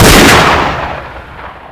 fire

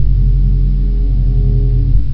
quiet stairwell ambience

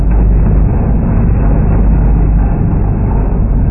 mothership

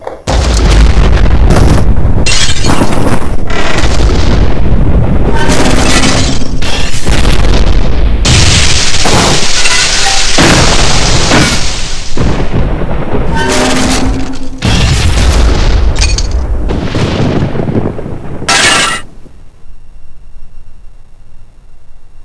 big break

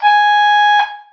ring

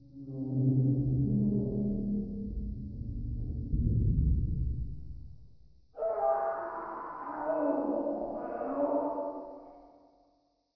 distant fighting

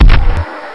vent

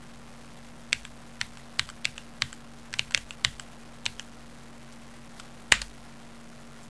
type

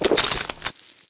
fat swallow

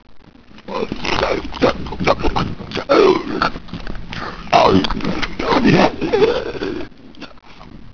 eat, superzombie

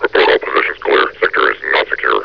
laugh; scmod

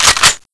spas cock

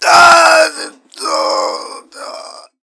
death; doctor

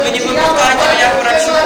bug gun

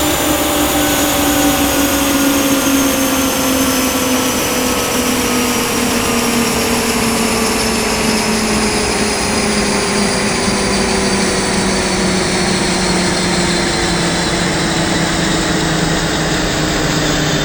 dropship land

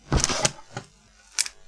book sound

